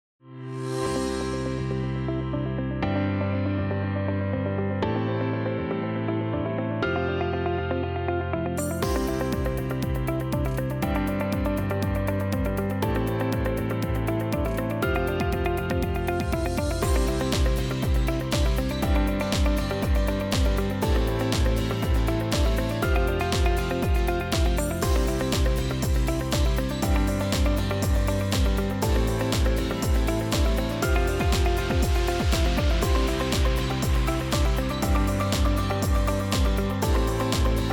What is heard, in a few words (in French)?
Musique d'Attente; Bouygues Télécom